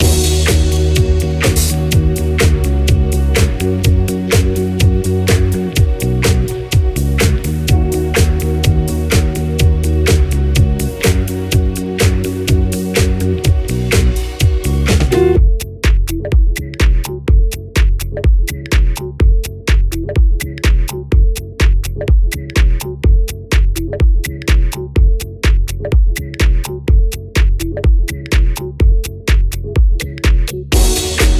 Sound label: sidechain filtering example